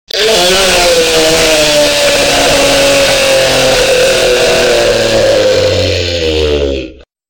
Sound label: dying; redzone zipbomb